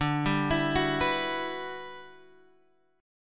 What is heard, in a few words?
E Minor 9th D, down, Guitar, Standard; Em9/D Chord {X 5 5 9 7 7} arpeggiated